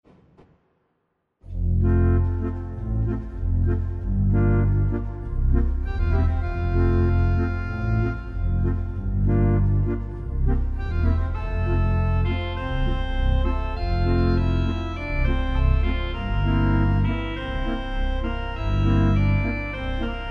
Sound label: Pop on Pipes; Wheels; Organteq; Pianoteq; Modartt